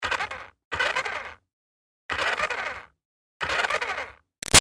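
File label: Toy Bounce Back Racer close up Revs Slow; Toy Cars; Toys; BigRoomSound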